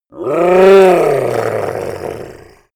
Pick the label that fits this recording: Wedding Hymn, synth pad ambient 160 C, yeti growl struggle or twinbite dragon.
yeti growl struggle